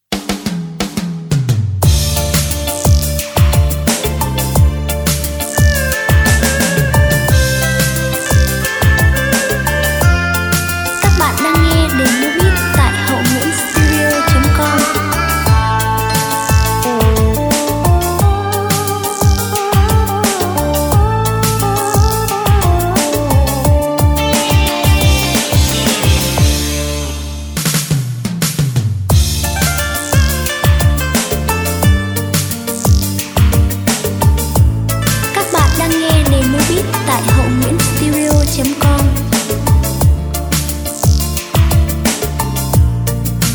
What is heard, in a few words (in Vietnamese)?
beat